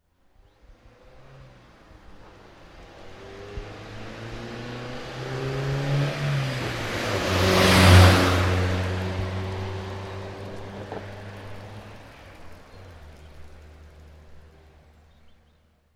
Car: pass by from right on asphalt road, subtle leaves blowing when car passes; Car Sound Effects